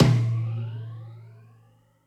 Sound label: tom one shot